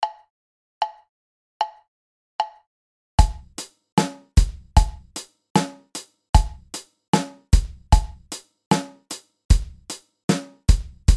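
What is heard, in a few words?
linear, drums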